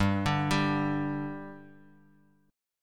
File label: G 5th G, chord